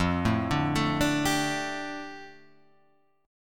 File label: A 7th Suspended 4th Sharp 5th, F; A7sus4#5/F Chord {1 0 0 2 3 3} arpeggiated